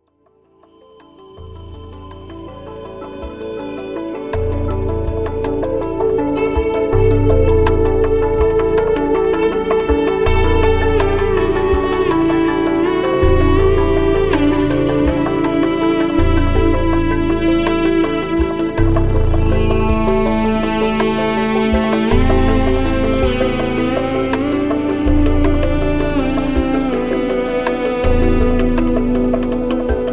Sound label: electric ladder; Soundscape